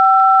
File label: DTMF 5